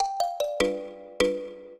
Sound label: trio, mbira; Mozart